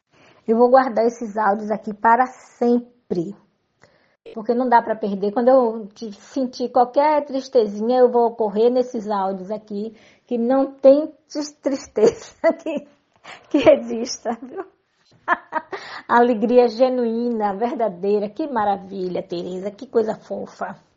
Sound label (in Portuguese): RISOS